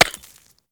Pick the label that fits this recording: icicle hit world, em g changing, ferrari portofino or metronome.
icicle hit world